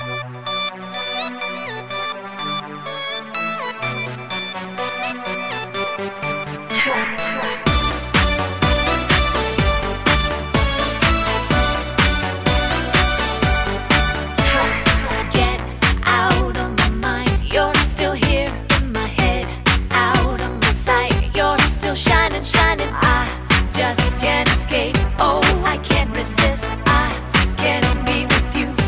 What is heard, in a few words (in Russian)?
samsung zvonkaya melodiya